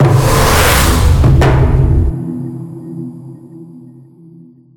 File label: Transition